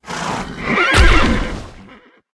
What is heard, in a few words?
caballus attack, monster